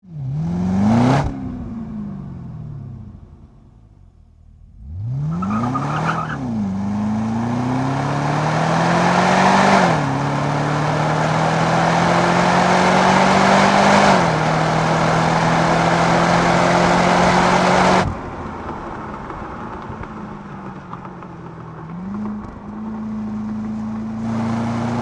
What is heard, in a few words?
Cadillac CTS V Coupe